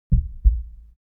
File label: human body heart beat double calm; human sounds; ضربان قلب